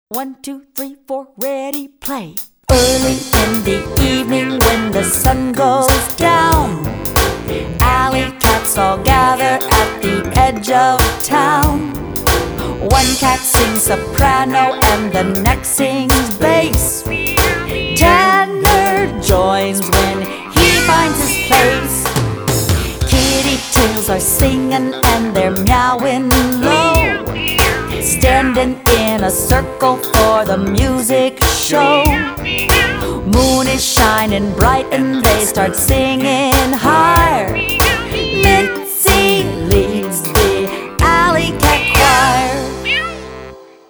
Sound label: Alley Cat Choir; My First Piano Adventure Book B